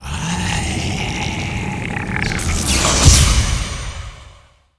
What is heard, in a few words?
ghost blader dead, monster